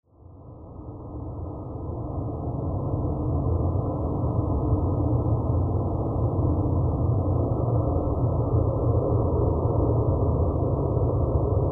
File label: Drones Deep